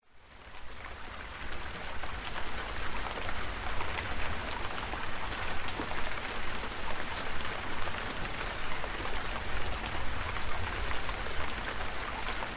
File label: Countryside rain with far thunders; west wolf